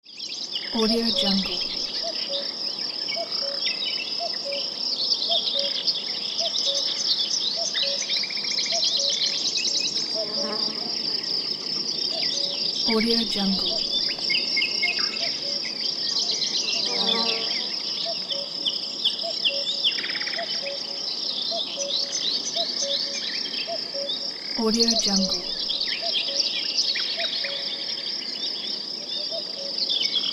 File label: forest ambience